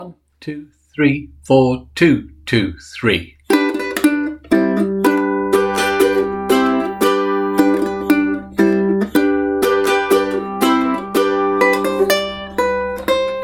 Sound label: Duelling Ukuleles - Practice File